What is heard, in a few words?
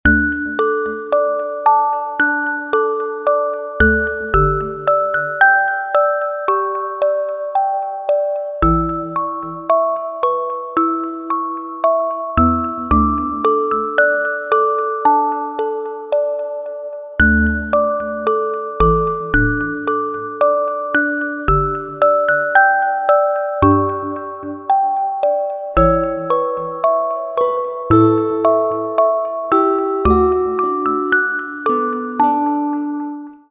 samsung galaxy note 10